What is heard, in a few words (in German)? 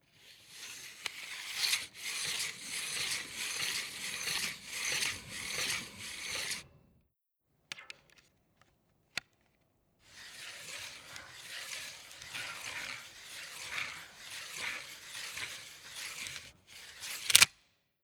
Stahlbandmaß ausziehen einrollen